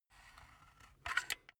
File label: silicone gun empty pump slow; soundpool